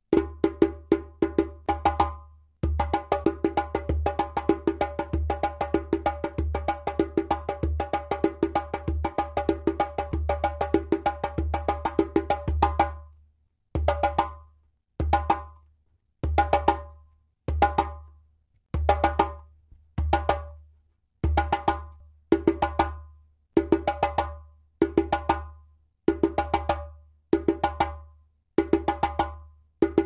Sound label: Solo Alleine